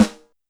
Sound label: Snare Dry, YAMAHA RM1X